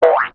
boink

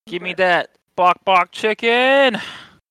bok bok chicken

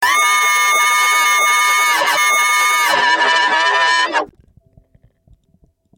jumpscare sound; five nights at asylum 2

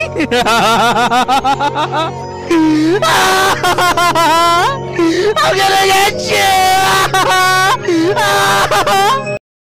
hihahaha

